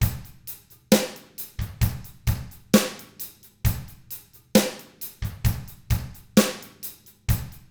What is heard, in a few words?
SLOW ROCK, Drums; ILIO; Double Platinum Drums 1